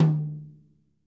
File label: mid tom